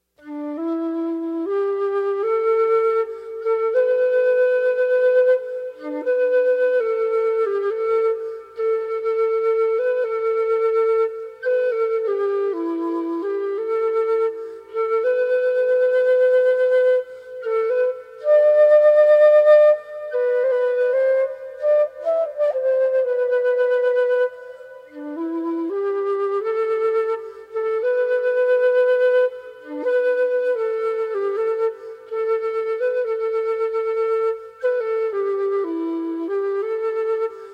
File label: Flute